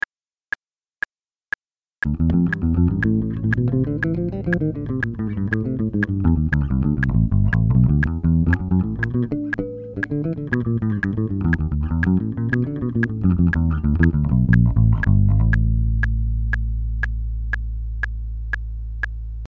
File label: Bass Clean; SamplePreamp; DT4-Bass